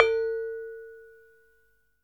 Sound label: SARON A3; Spectrasonics; Heart of Asia